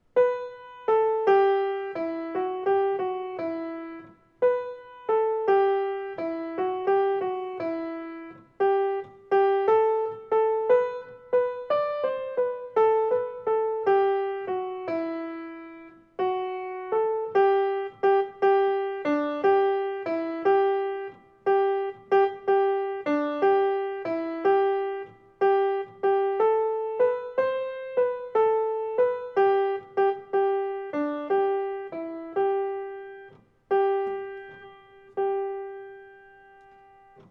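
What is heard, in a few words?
Three Kings Of Orient MELODY; Gaspard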